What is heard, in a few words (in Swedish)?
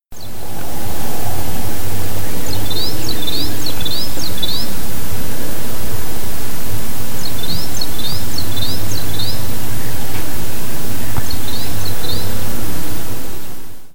Fagelkvitter